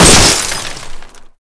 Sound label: car windshield; Modern Warfare